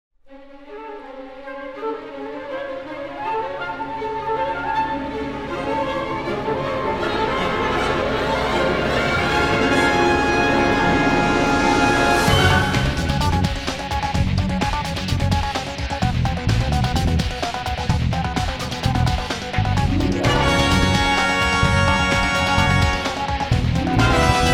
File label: theme orchestral; Knight Rider